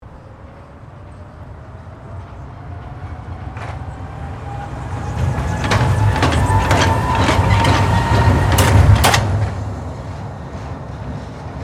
MONORAIL PASS; Sydney; Syney's Monorail system; Trains; Vehicles

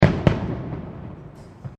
Fireworks Multiple Explosions

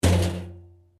Pipe Tin Move; Metal; Sound Effects:Materials; Foundation3

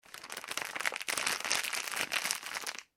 Plastic Packaging Movement; Plastic Packet; Materials; McKinney Sound

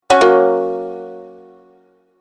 Message Alert, beep

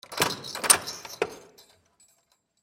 Jail Door Key Twist Open Jingle; Prison Door; Emergency; Prison Doors (Sound Effects:Doors

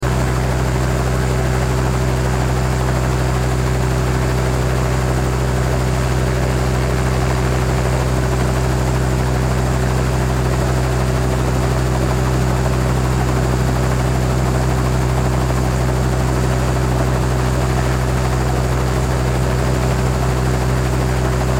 Helicopter Ext, Helicopter Exterior Blade Loop; Engine; Transportation Aircraft; Helicopters

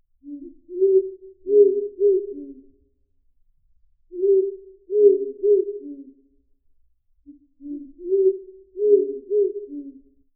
Owls Loop; Birds; Animals

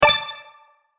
Sparkle Strike, magic sound effects

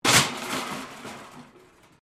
metal rubbish bin smash concrete

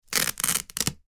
velcro, Velcro Rip; Velcro Rip / Pull; Ripping; Objects; McKinneySound

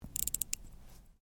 Watch Clockwork Wind, Click; Objects

